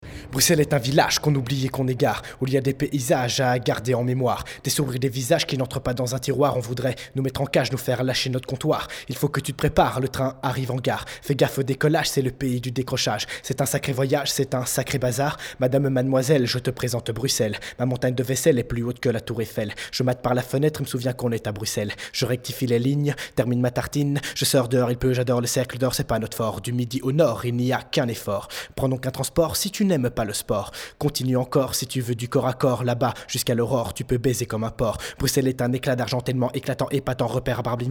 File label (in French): rap bruxelles; Radio Berenkuil La Cage